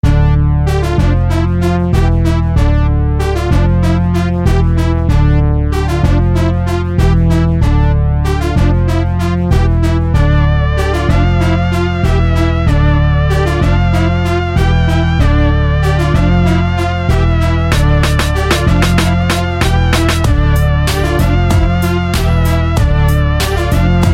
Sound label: guitar beat, rock; ringtones